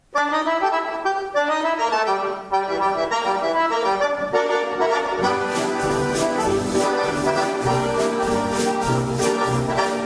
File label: Accordion